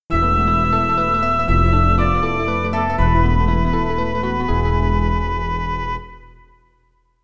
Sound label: Game Over (MT-32); Hioden II Dash; PC-98